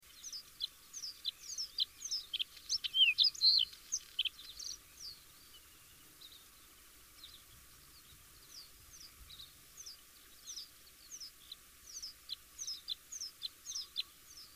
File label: Bluethroat